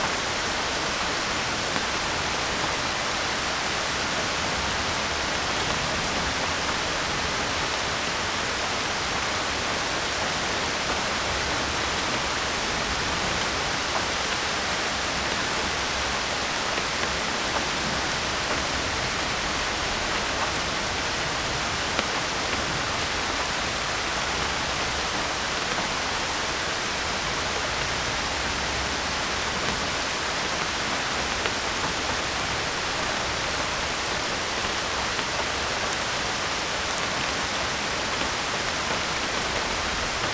Binaural Beats Brain Waves Isochronic Tones

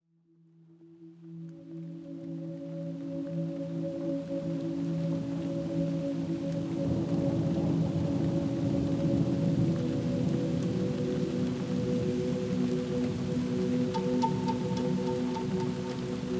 Heavy Rain with Lightning; Hypnosis; Nature Sounds

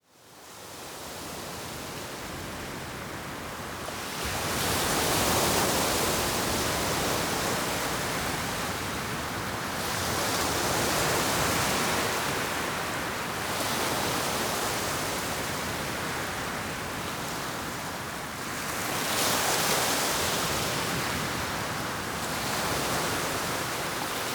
Ocean Noises

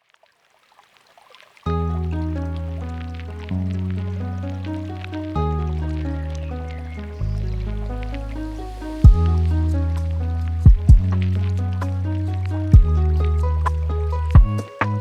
Lofi Remix; Chainsaw Man

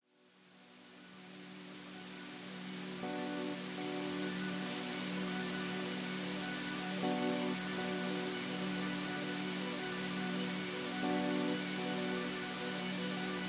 A Peaceful Ambience

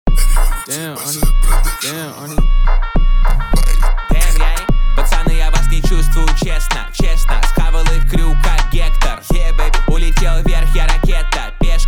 Bass 26-27-29 Hz

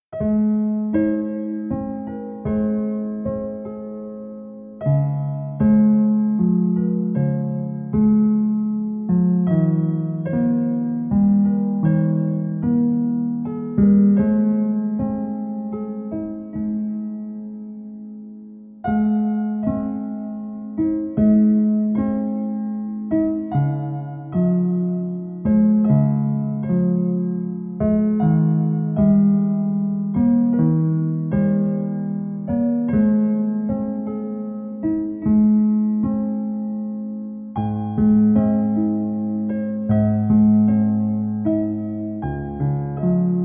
432Hz Piano

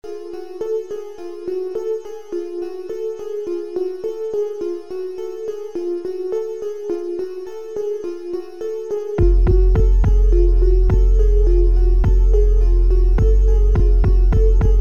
Instrumental

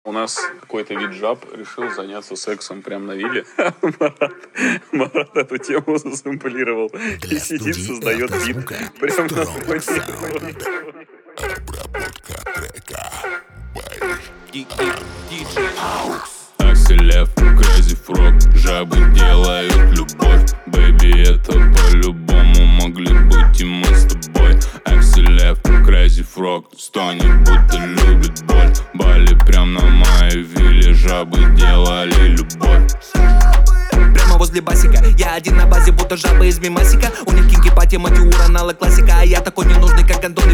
41-46Hz Low Bass

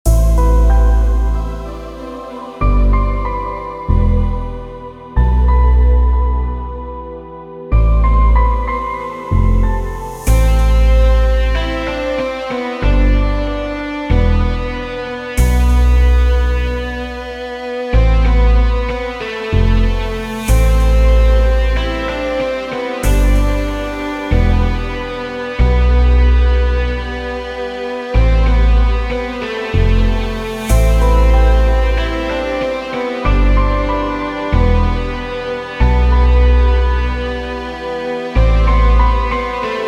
Sad Instrumental

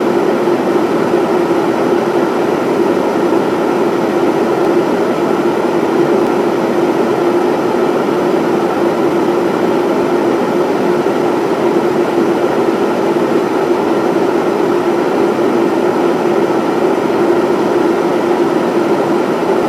White Noise for Sleep

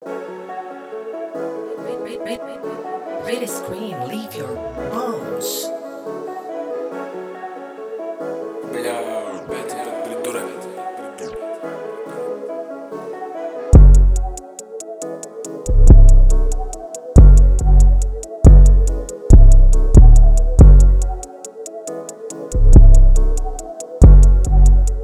type beat

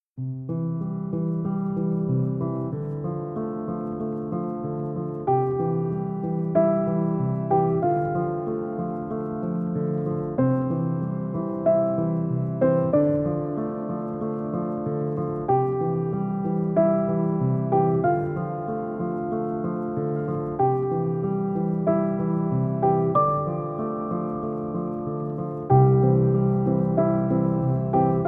Easy Listening Piano

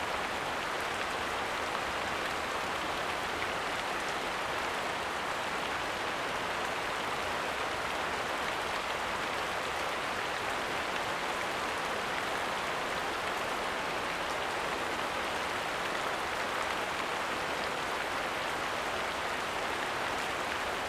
Rain

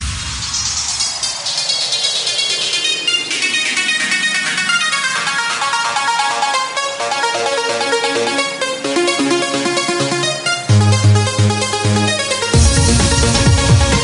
Electro House

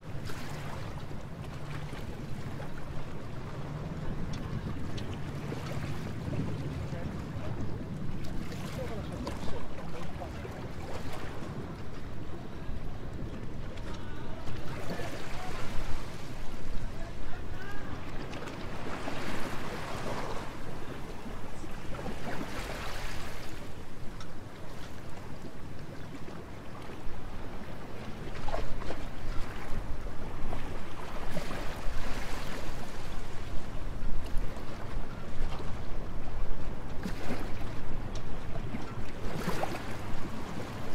Sea Port Water White Noise

